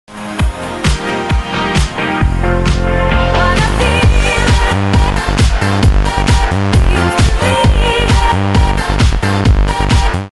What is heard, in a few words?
win, music